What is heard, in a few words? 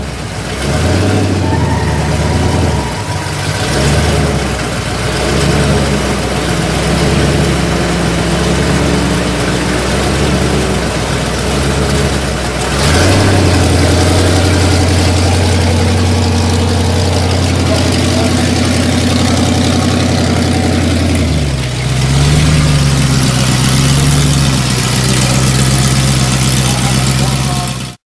Chevy Nova Blower 67